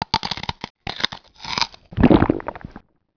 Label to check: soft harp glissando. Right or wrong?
Wrong